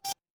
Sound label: ui beep